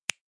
make chariots

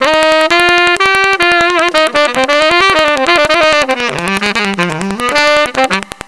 Sax; Sound Factory; Cool Licks

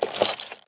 add to exchange; Immortal

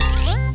move; Microshaft Winblows 98; Parroty Interactive

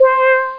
Moog; HyperComposer

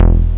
J-Bass; 8,363 sample rate

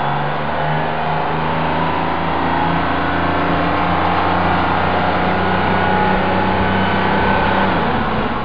BUS LOOP; Busy people of hamsterland

